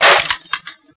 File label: glass; christmas lights